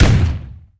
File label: GEMS Skull; Puzzle Chronicles; PS3; PSP; X360